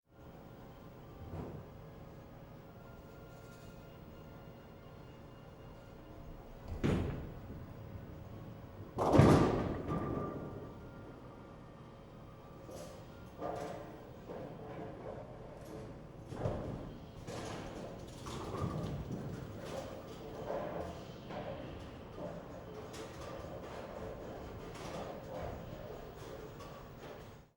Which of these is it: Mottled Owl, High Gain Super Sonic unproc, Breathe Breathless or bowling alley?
bowling alley